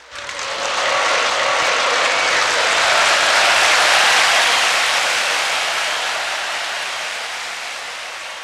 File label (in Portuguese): APLAUSO